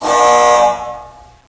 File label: door klaxon; dm criticality